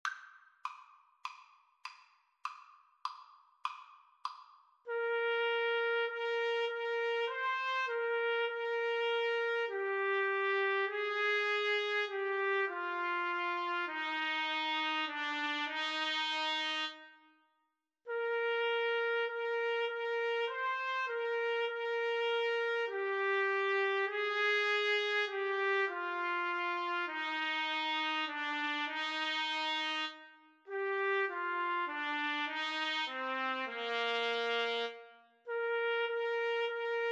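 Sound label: lo how a rose trumpet duet karaoke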